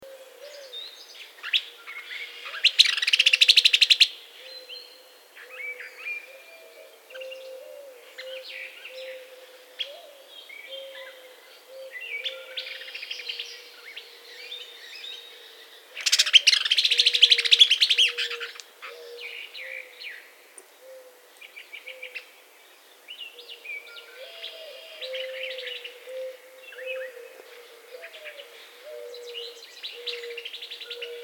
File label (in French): tyran de l ouest, chant